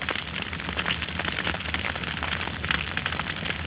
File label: fire